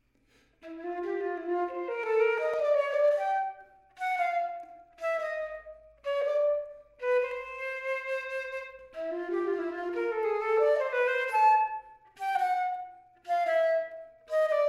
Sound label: Flute and Piano; strauss; Voices of Spring